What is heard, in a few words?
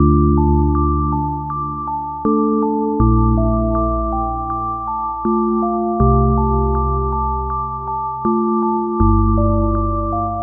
defeated part 160bpm d minor; looperman